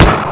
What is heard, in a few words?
gat shoot, weapons; wanted